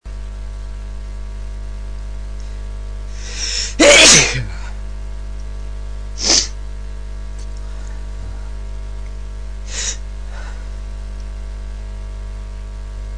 allergies, male

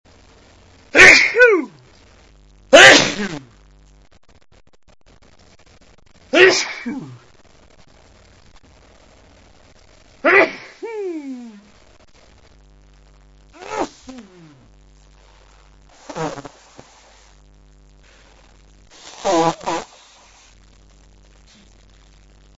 cold, male

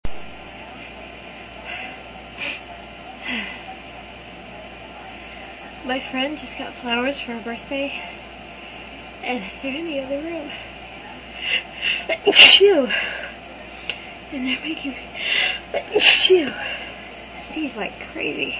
flowers, female

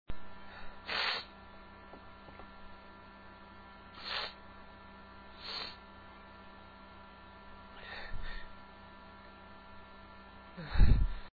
i just gotta sneeze, male

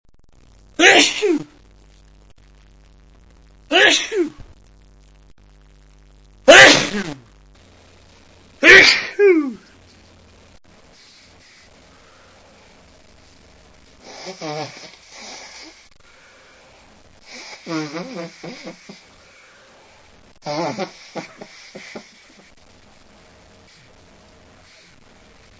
damn nose, male

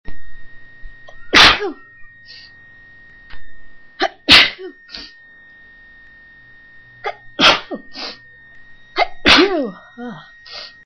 ugh, female

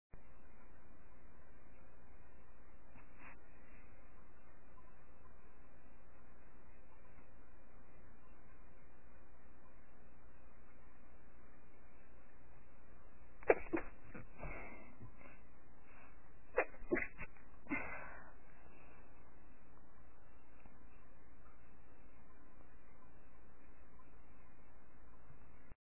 lil double stifles, female

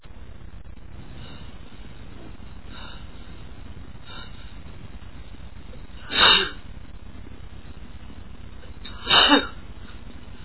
photic, male, achoo

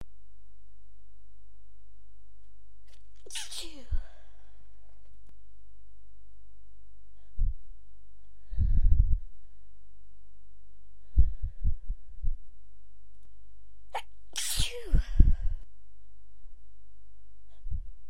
shiver, female

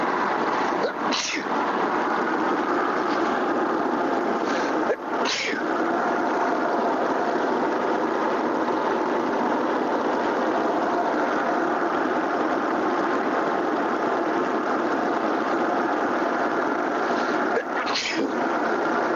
car sneezes, male

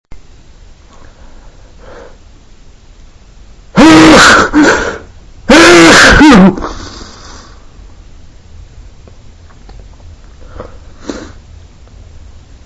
more cold sneezes, male

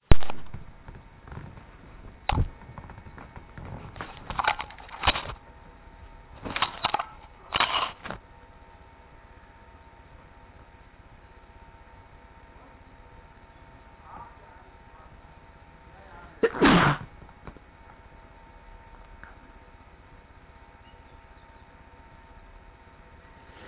stifle try, male

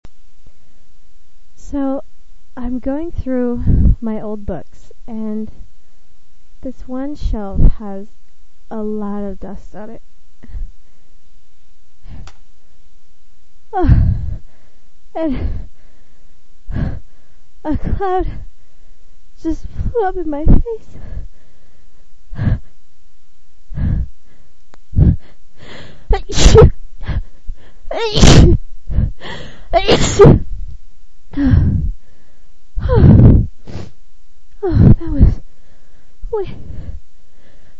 dust at me, female

